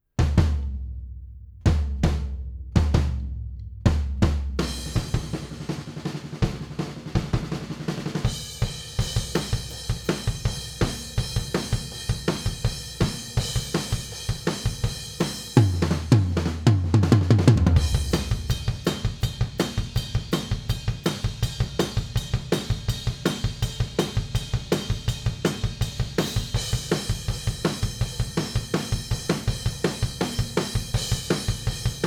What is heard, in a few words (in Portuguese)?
Click Turbo Bateria Antes